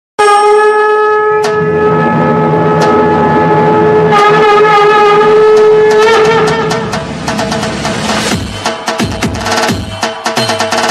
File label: Ganpati Visarjan Dhol Tasha, Ganesh Chaturthi